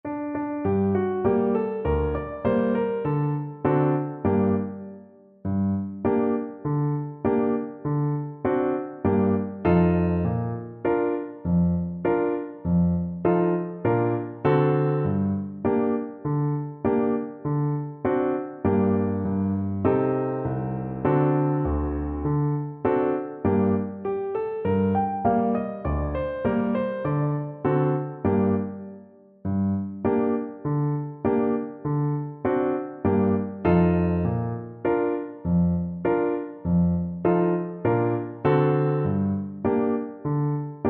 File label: Tuba